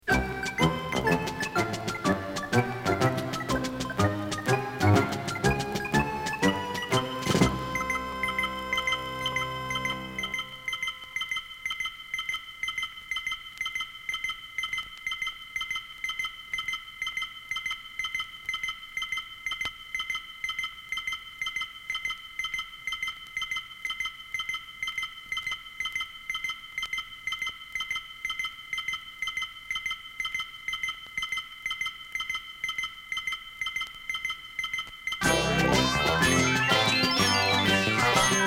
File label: Short Dink Dink Headlines Bridge